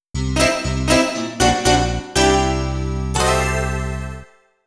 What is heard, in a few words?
Defeat Jingle; tokimeki memorial 2 substories leaping school festival